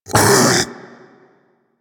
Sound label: Infested Splitter Split Vocal Pain; Warframe; Grey Strain